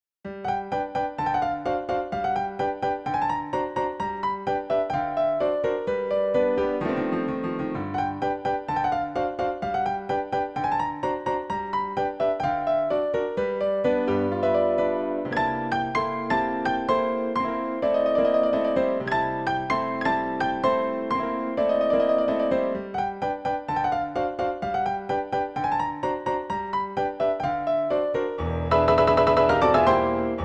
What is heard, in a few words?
rhapsody, piano